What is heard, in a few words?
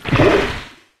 paint blob